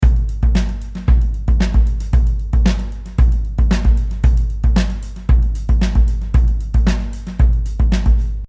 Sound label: Drums VINTAGE DRUMMER; NI ABBEY ROAD